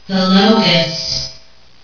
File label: LOCUST